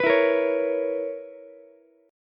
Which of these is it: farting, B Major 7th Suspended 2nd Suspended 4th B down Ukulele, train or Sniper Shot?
B Major 7th Suspended 2nd Suspended 4th B down Ukulele